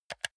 console controller r1 press fast hard

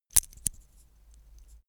metal watchstrap click

